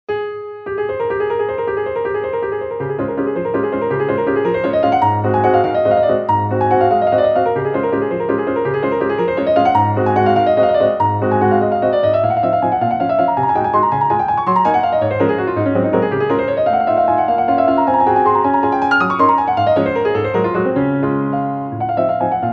waltz piano; chopin; koinunowaltz; 子犬のワルツ; ショパン

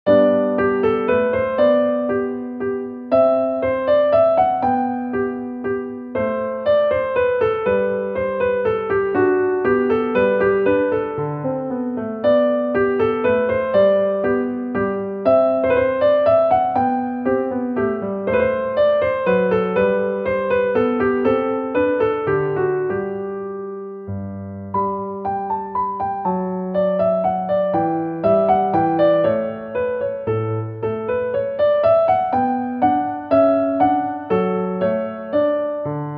menuet piano; bach

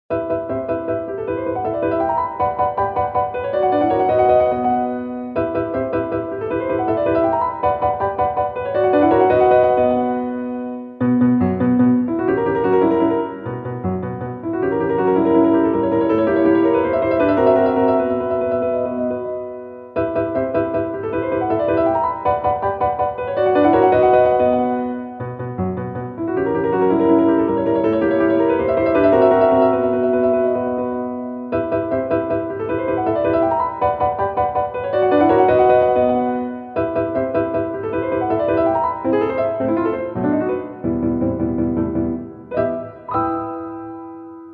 concert; grieg

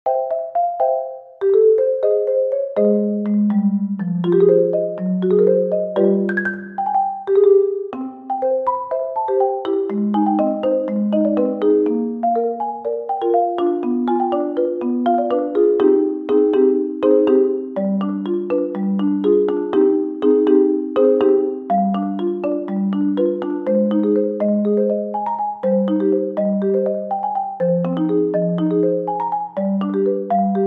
marimba

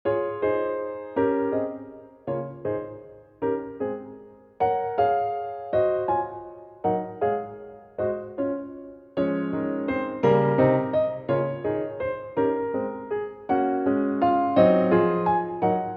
piano; beethoven; pianosonata14

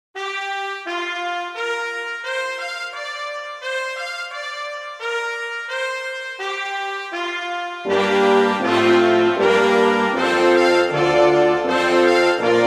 orchestra; mussorgsky; promenade

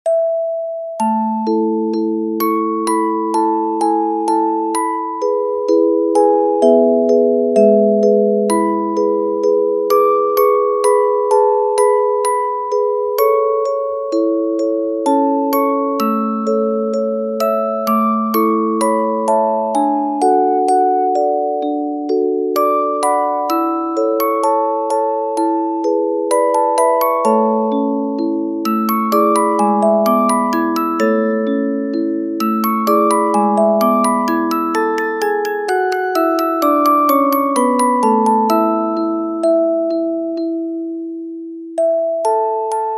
horn concerto; classic; mozart; hornconcerto1 1

